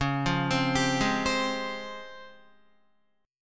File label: C sharp Major Sharp 11th, Guitar; C#M#11 arpeggiated; 8,8,12,12,8,8; JGuitar